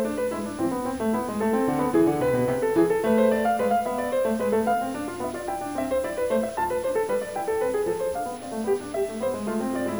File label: song embedded